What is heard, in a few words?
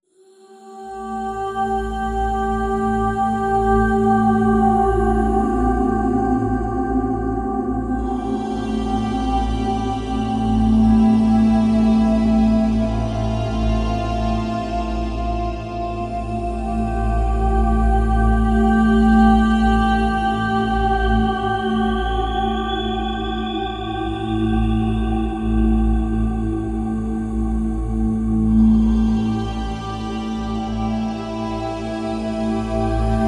Equinox Drone